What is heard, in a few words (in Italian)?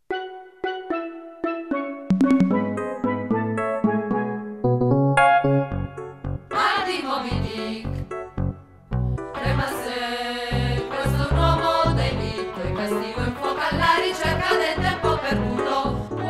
vocalese ripresa